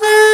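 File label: taegeum A#2, Korean; AKAI S6000 CD-ROM - Volume 1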